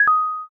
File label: stop button